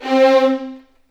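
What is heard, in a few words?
C4 violins; The Ultimate String Collection; East West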